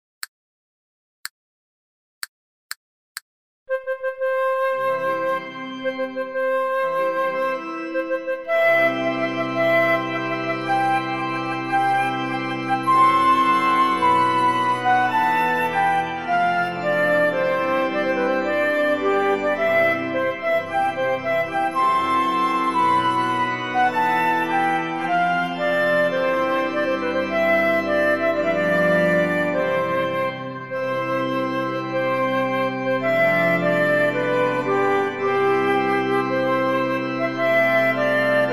C play flute reference

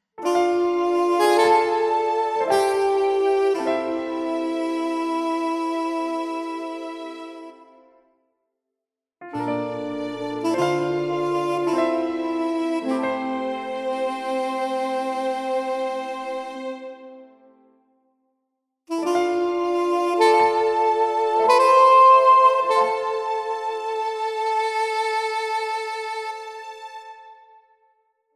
saxo

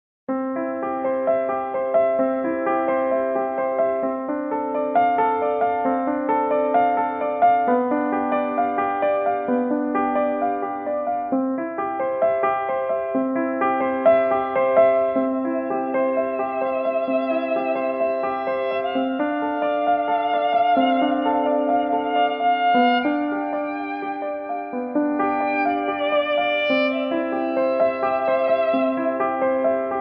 violin

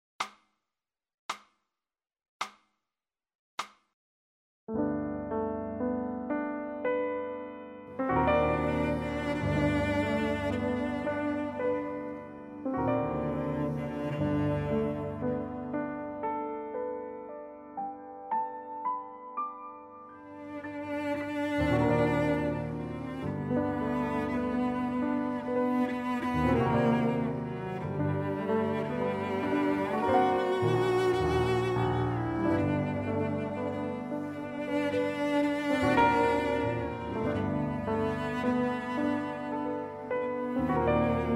piano cello, G

